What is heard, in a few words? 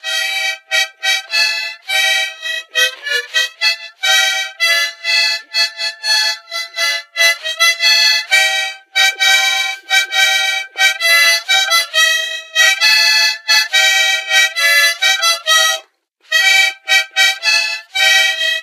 harmonica; Soundscape Overhaul